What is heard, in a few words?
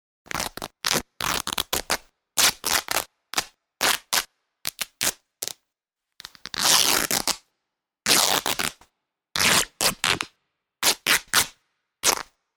Tearing Fabric, Sound of ripping fabric.